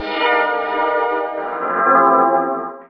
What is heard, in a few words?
Synth - 5A, Jungle; Riffs, Arps & Hits; Blu Mar Ten; Jungle - 1989 to 1999